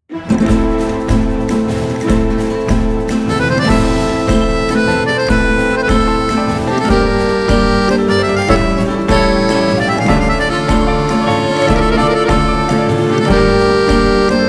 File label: Ticking Labyrinth; PeriTune